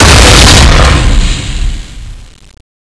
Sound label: charge shoot exp; cstrike; weapons; gungnir